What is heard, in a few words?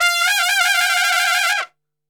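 F trumpet shake; Best Service; Brass Super Section; TRP SHAKE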